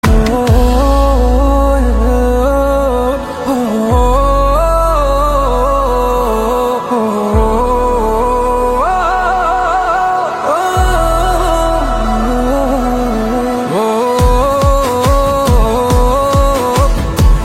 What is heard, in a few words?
voice raga